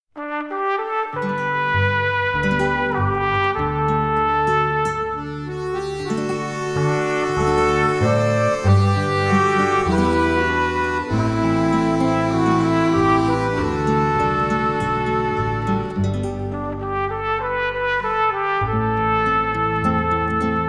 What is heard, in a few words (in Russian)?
музыка